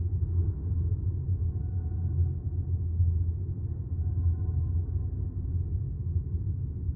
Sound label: wind mine; ambient